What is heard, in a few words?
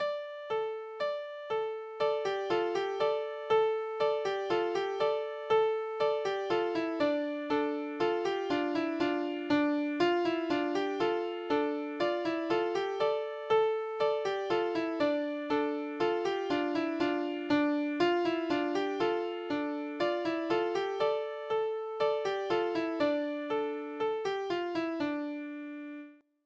snow dance canon